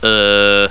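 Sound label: player jump; butthead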